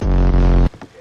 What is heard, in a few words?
black screen cut off